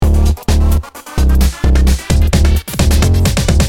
Intro Transition; Anki Drive; Android; Anki Drive Track 01

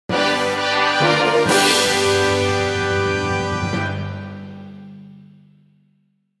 fanfaare; Lunar Silver Star Harmony; PSP

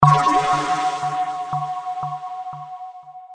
New Feature Unlocked BGM; sine mora ex gamerip

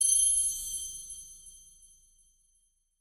caves crystal ding

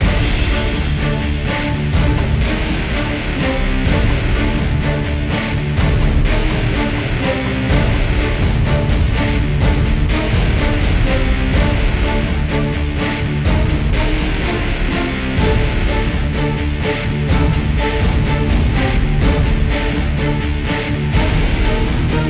Music Smash Loop; The Incredible Hulk; PlayStation 2

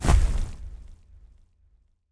Level Editor Remove

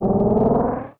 Cloak

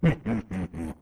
laugh

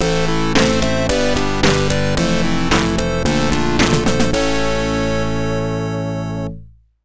Jingle (OPN)

